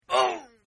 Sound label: Grunt; Grunts; Sound Effects:Voice; Partners In Rhyme